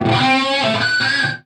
straight up guitar lick

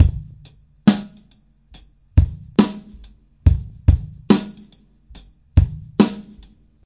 muted funkiness, drum loops and breaks, 070 bpm; 000 to 080 bpm

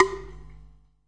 Small pot, Clay Pots, ETHNIC and WORLD PERCUSSION

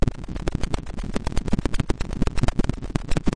electro plumber; Electro and Synthetic

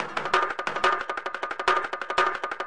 bongo break, DnB Breaks, 179 bpm; 141 to 185 bpm; Ethex

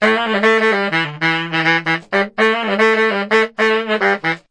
sax twiddling; HORNS SAX TRUMPET BRASS

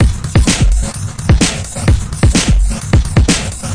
128 bpm crunch n doom ouch, drum loops and breaks

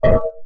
metallic, synth

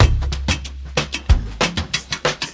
down on the break, drum loops and breaks, 94 bpm; 091 to 100 bpm

